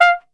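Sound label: F3, flugelhorn, brass; Best Service; Brass Super Section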